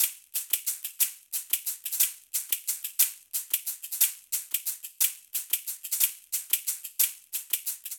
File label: Afro Cuban Caxixi 120bpm, Latin Percussion; Ableton; Factory Packs